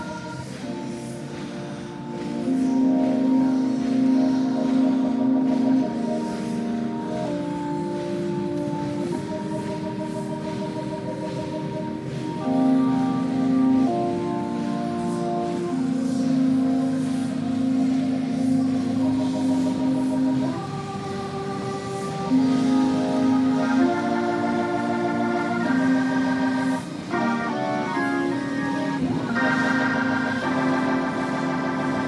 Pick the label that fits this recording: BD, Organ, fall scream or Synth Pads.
Organ